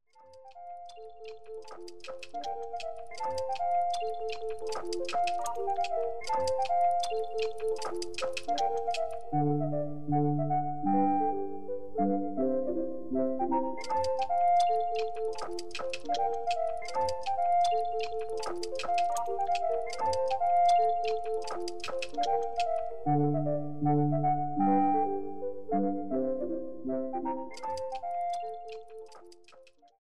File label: radiophonic music; bbc radiophonic workshop